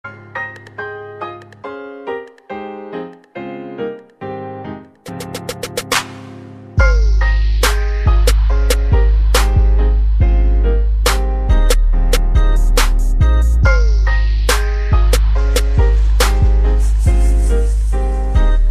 Jazz piano

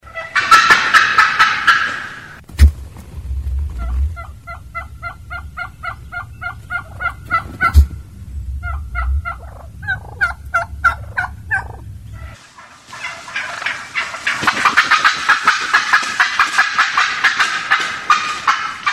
turkey drum; animals

